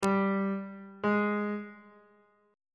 a flat dim8 perf8 intervals

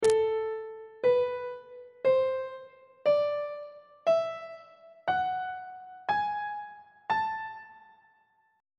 b double flat melodic minor scale on treble clef

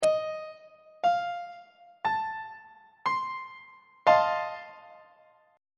f dominant 7th 3rd inversion on treble clef

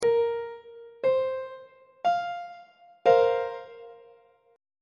b flat suspended 2nd chord on treble clef

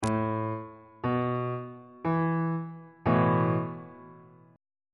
d double sharp suspended 4th 1st inversion

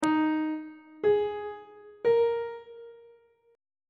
e flat suspended 4th triad chord intervals; on treble clef

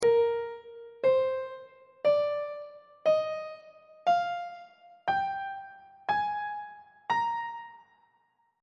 b flat mixolydian mode note positions; on treble clef

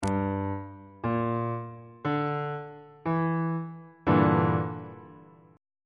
d double sharp half diminished 7th 1st inversion; bass clef

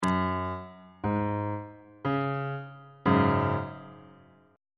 c sharp suspended 4th 1st inversion on bass clef

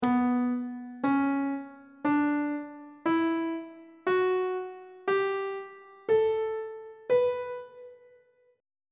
c flat melodic minor scale classical descending; on treble clef

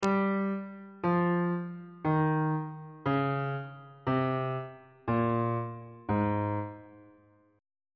g sharp ionian mode descending on bass clef

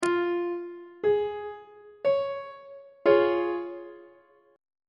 c sharp major 1st inversion on treble clef

